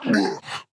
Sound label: Grunt Jump; Grover; Paladins